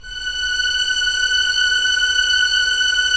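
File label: Strings Violins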